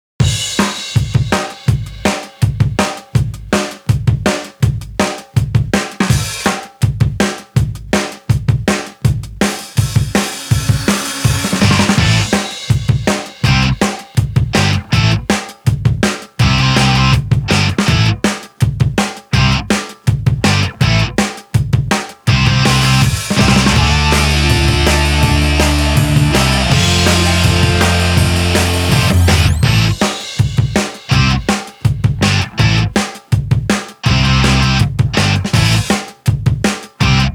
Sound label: (Short ver. Instrumental); criminal girls